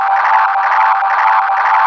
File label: VFX2 Loop 128 BPM